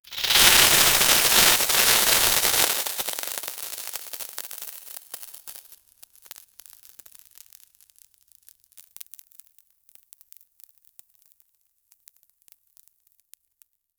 confetti burst into the a

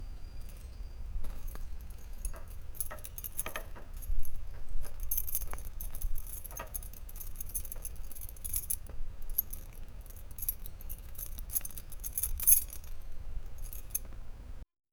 some keys fall from the

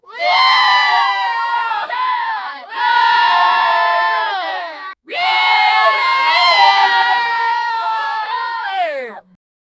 energetic group of young, cheering

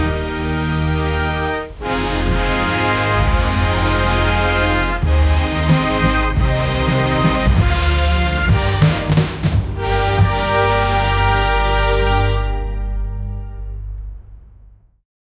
classic vintage orc; 1970s

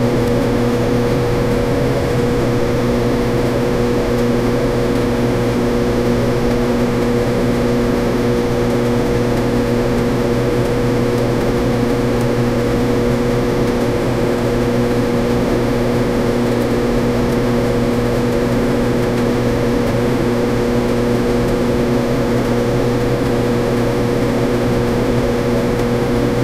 air conditioner continues, appliance

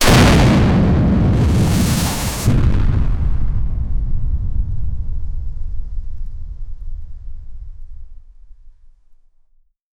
artillery shell flying

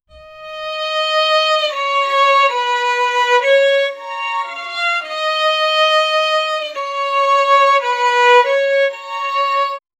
sad violin; instrument

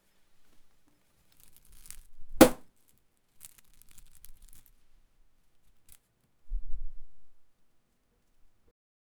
realistic soft plop of an; object manipulation

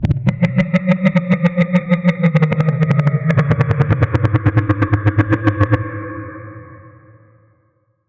a slow glitchy menacing, scifi

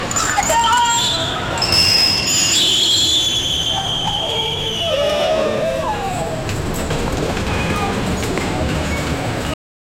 terrified screams erupt; baby cry

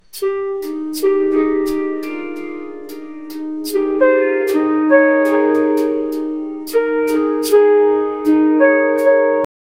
a medieval lute and drum, out of tune

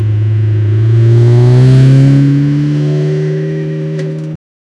g class slowly driving to, vehicles

